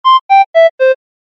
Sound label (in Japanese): 場面切り替え 笛風